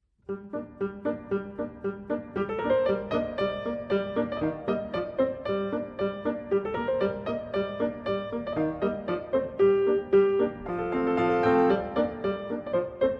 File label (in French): Ballade enfantine, piano solo